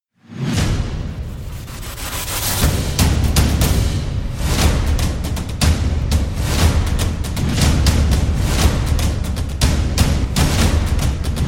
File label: trailer backends perc